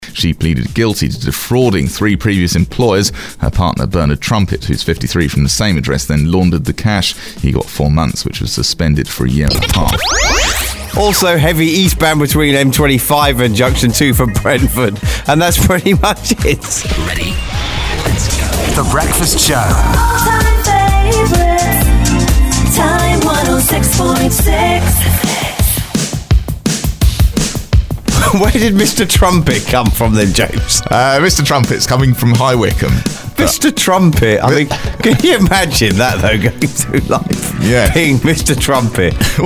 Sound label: Mr Trumpet